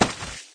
splat hit, paintgun; There; ThereClient

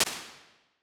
Early Reflections Live, Impulse Responses; Ableton Live 12 Lite; Hybrid

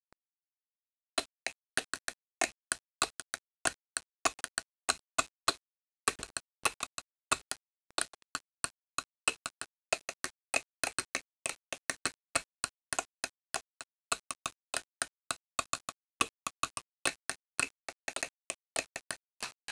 drumming

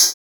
Hihat Closed

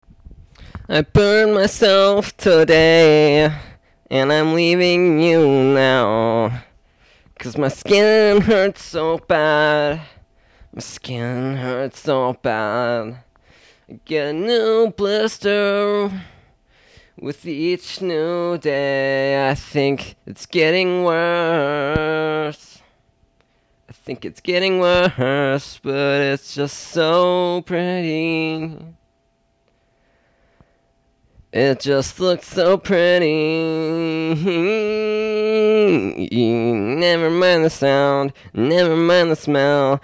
Emo Song

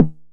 Snare 909 Tune

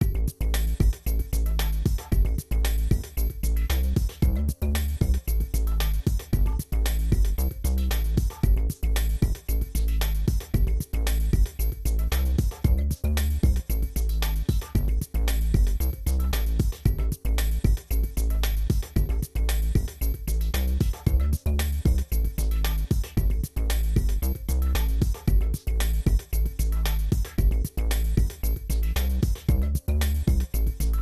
Groove; Microsoft IntelliPoint

